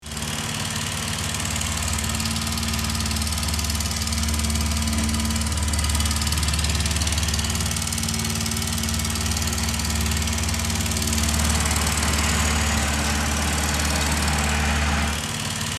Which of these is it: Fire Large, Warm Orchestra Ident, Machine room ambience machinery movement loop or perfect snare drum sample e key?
Machine room ambience machinery movement loop